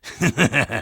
evil laughter; engineer

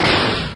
sfx whip snap

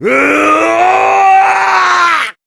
uoh

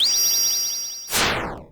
scream outro; pmd2

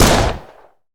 gunshot

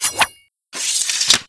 katana away

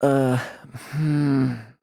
uhhh; leon

